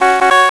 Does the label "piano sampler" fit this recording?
No